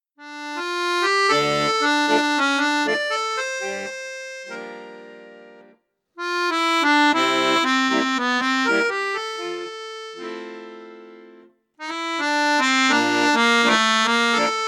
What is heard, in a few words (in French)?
registre flute juste, accordeon; piermaria 324